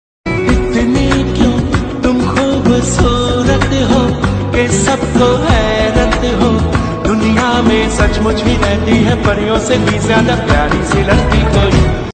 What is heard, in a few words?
hindi ringtone